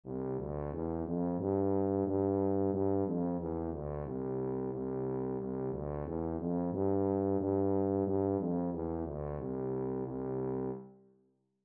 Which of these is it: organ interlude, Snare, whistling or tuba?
tuba